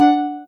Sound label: soft hitwhistle; osu